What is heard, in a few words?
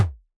explosion